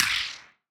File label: Wider Clap